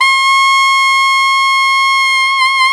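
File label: SAX FLAGO, alto sax D3; Best Service; Alpine Volksmusik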